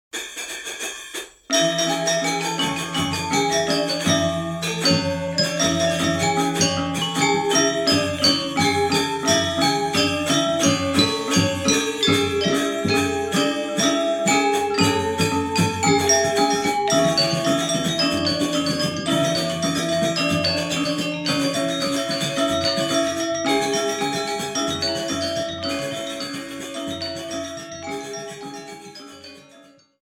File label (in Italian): Musica popolare Bali